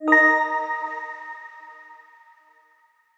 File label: pluck powerup A#; Melodies; One Shots; Slate; AURORA Sample Pack